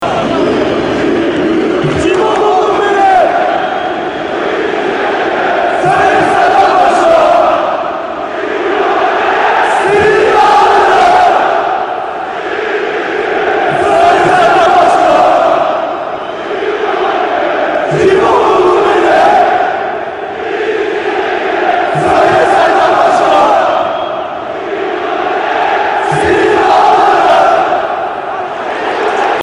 bolelshiki

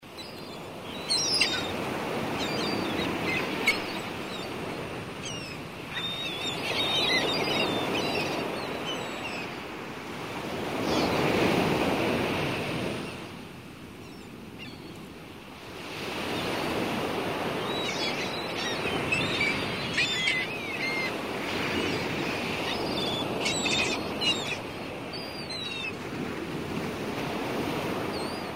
shum okeana s chaikami

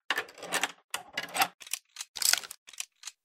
perezar arbaleta

